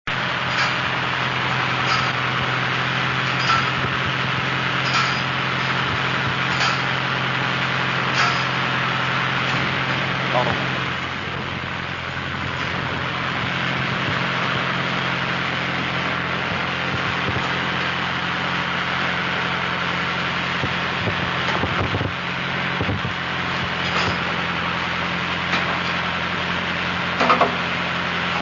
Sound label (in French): machine tracteur